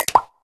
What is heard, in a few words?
show settings; Candy Crush Friends